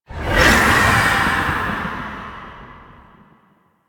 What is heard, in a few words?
Apparition Release; Warframe; Warframes; Dagath